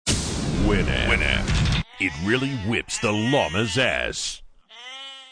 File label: llama